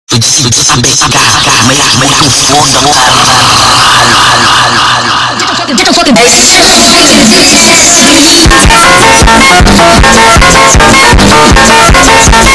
Funk sped Up

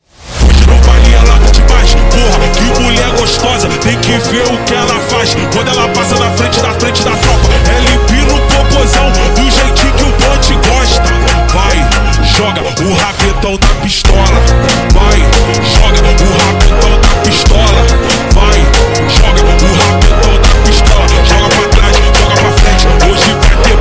Brega super Slowed, funk

